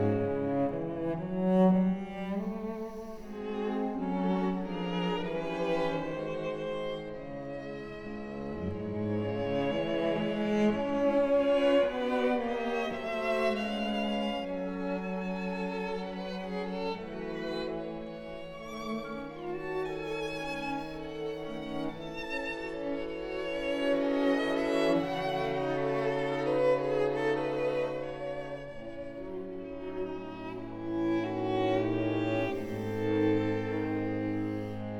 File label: String Quartet; Classical; Chamber Music